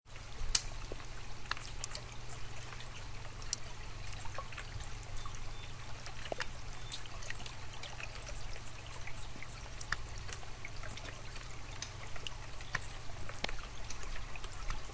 Windigo Dock Underwater, Isle Royale National Park